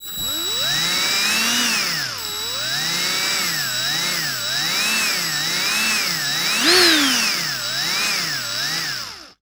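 Screwdriver - Sustain Fluctuating Close, Power Tools; Screwdriver B; Dynamic Mono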